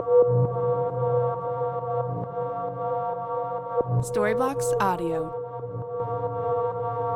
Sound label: Ambient House Ambience 134BPM F